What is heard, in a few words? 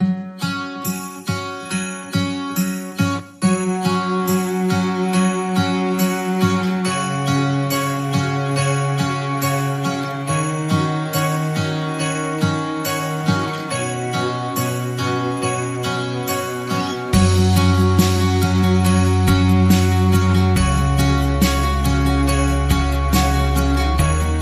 Joyful Acoustic Christmas, No Melody